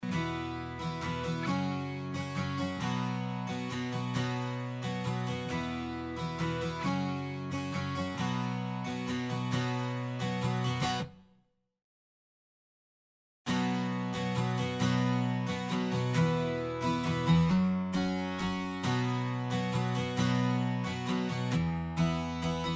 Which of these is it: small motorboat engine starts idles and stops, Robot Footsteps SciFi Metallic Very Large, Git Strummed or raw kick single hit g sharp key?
Git Strummed